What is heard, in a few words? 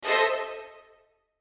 high strings chord